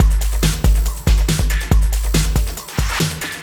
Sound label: Loops BB 140, BreakBeats; Vengeance Samples